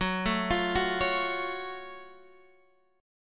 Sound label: Gb Minor Major 7th, Guitar, Standard; arpeggiated; x,9,7,10,7,9; JGuitar